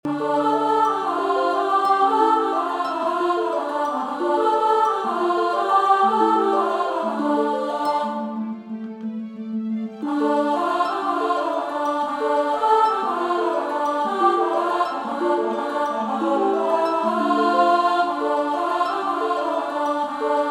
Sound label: alto